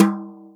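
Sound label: Brush tom, Boss DR660, mt; drum machine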